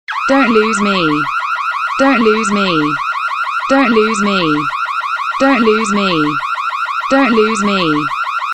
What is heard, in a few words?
alarm